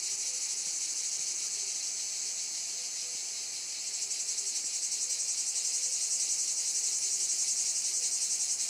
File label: Cicada